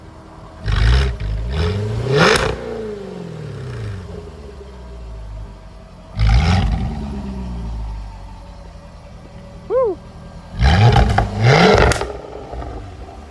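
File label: Jaguar, exhaust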